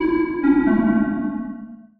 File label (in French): Sonnerie